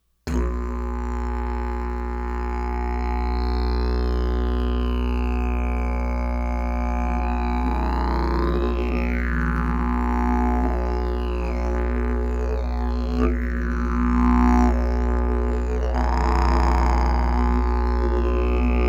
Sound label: didge